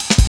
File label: Klanga Cut, drum loops 165.5; Zero-G Jungle Warfare 1, 2 & 3; 90 s Sample CDs